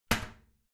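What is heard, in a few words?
toilet seat lid slam regular, bathroom; haus-garten; badezimmer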